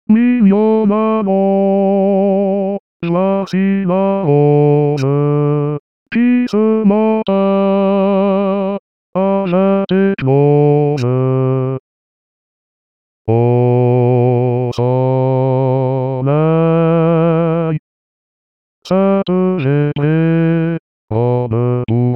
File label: Baryton